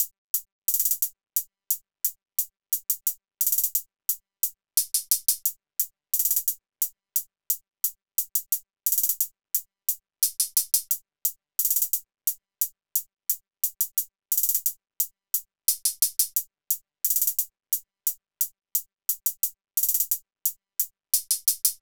Hihat Loop - 88 BPM

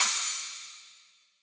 Crash; Cymbals; Cymatics; Dubstep Starter Pack; Drums - One Shots

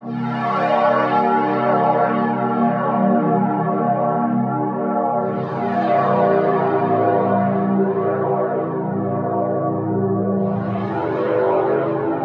Pad; Dubstep

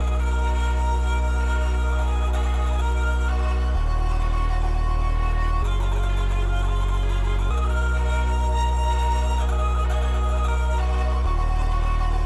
128 BPM C# Min, Melodies; Afterlife; Cymatics; Immortal Production Suite Beta

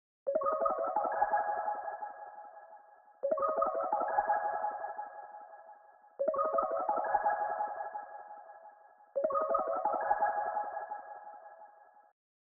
162 BPM C# Min Texture, Loop Stems; Melody; Melodies; Cymatics; 2022 Melody Mystery Expansion; Feelings

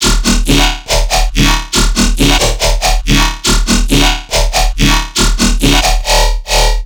Riddim Bass Loop - D# 140; Calibrate; Ghosthack; Riddim Essentials Volume 2

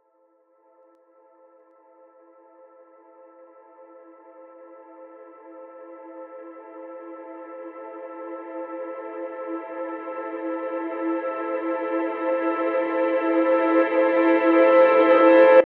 Riser

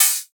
OH; Riddim; One-Shots; Hats and Cymbals; Ghosthack; Riddim Essentials Volume 2

